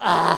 Death Vocals

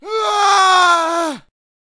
Harpooner Attack Vocals

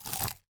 Raptor Bite Sounds

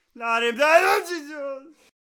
Divine Arbiter Death Vocals

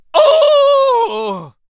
Lasso Death Vocals

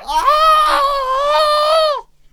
Snake Archer Attack Vocals

